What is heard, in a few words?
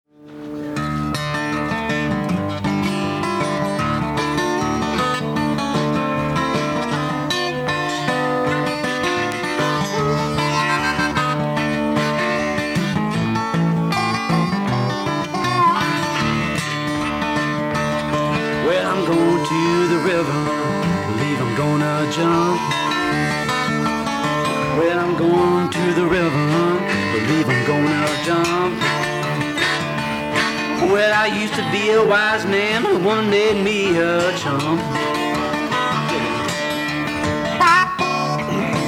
Blues